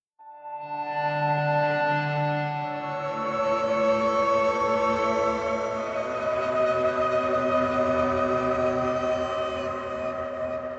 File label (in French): guitare celeste